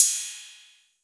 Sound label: Roland 808 CY